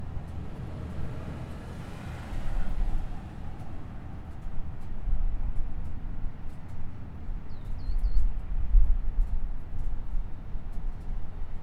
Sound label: Warehouse Bulk Buy Parking Lot